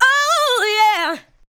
ADLIBS

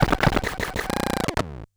Bent Talking Teacher, Circuit Bent

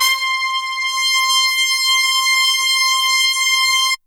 HORNS C5

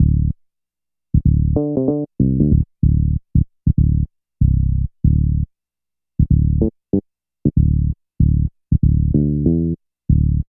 BASS LP; East West - Phat & Phunky CD2; 90's Sample CDs

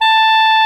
SOP SAX A5; Greytsounds VOL-1

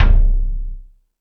More Toms, Drum Machines

